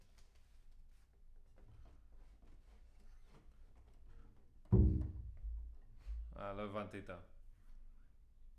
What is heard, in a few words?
Bass Room